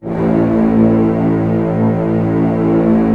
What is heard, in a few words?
ORC A0 FF, Orchestra; Sound On Sound - Peter Siedleczek's Orchestra